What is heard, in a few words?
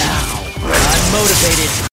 Motivating Ringtone